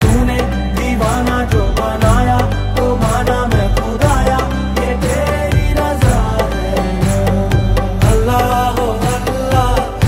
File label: Ringtone; Sarfira